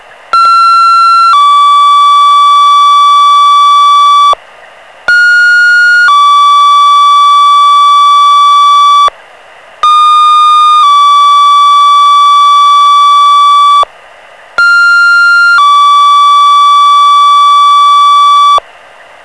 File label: tones